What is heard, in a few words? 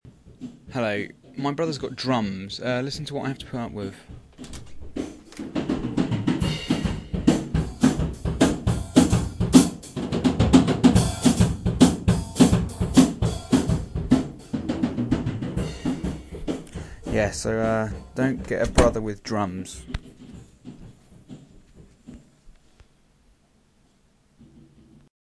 drum